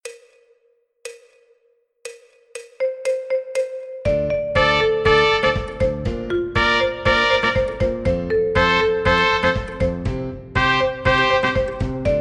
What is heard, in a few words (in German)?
Melodieinstrumente Motiv schnell